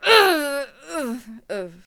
Bomb Thrower Death Vocals

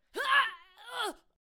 Exiled Sentinel Death Vocals

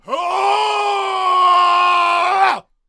Jarl Attack Vocals

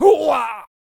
Death Vocals; Flag Bearer

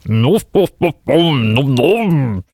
Vampire Attack Vocals

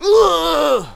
Monk Death Vocals

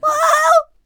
Attack Vocals

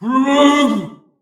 Swordcaster Old Attack Vocals

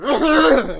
Cannon Attack Vocals

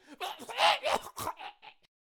Mad Mechanic Death Vocals

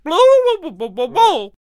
Potionseller Attack Vocals

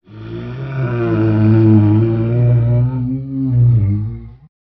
Ice Giant Death Vocals

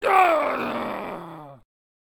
Headbutter Death Vocals

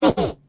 Banshee Attack Vocals

